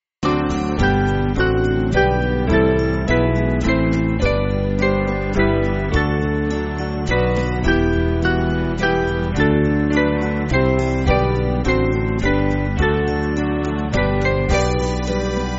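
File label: Band, Music Backing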